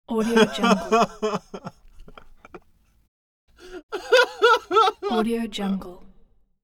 male laughter

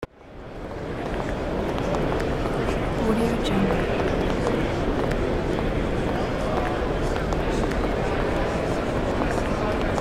airport sounds without a speaker; almira23

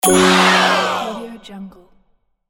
contest answer

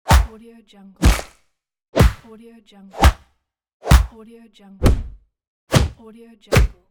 fist punch

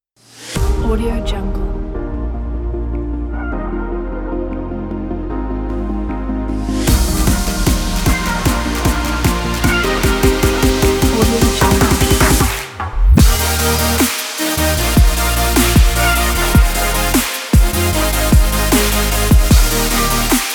summer future bass music

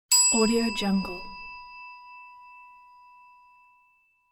doorbell

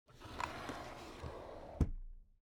foley office drawer cabinet stuff close soft; smartsoundfx

